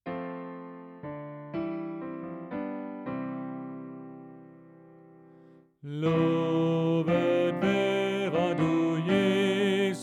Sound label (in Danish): Tenor